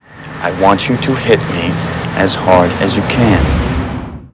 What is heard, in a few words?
hit hard